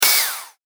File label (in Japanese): 小型ロボットの駆動音